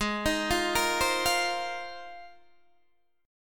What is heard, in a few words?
G sharp 6th Add 9th, Guitar, Open D